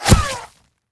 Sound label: ground hit, damage; DCS